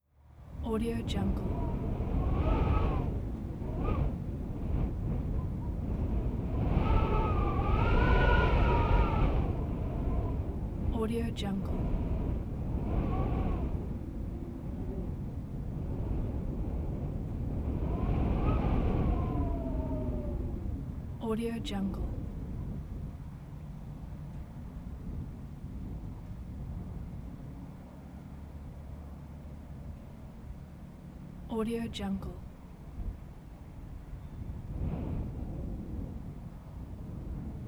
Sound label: wind heavy howling